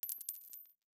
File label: battle round end; balance update